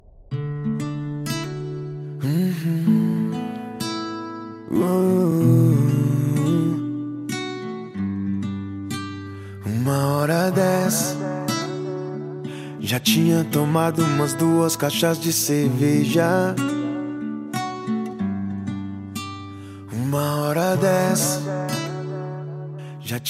rap; cd rap flash sound

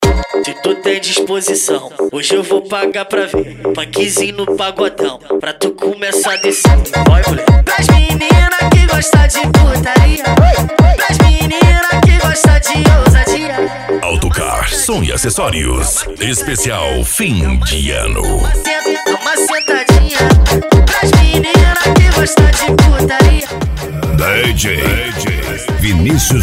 funk agressivo ritmado e funkadao

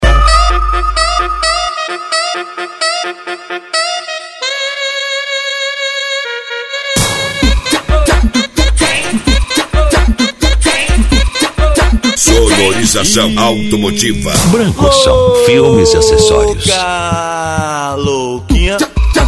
dance e funk retro; branco som

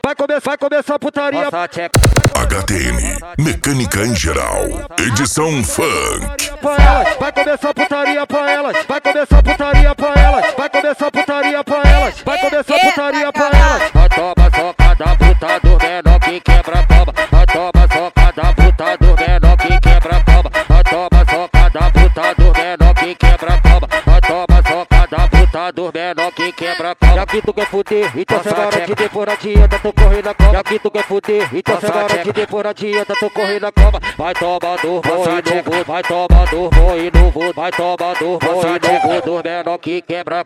mecanica em geral edicao funk

